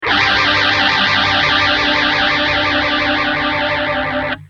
gtr

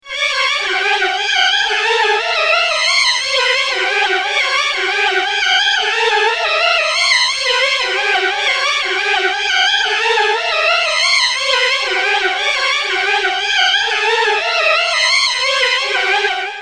strings, ADA flanger; x5dr

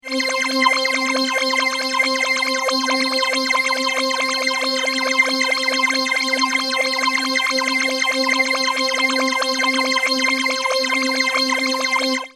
Mutron biphase

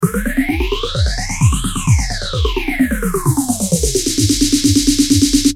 drum loop phase filter

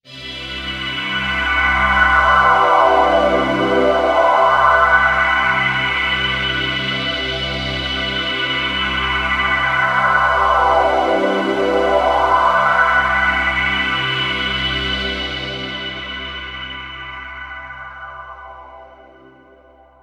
poly pad pigtronix

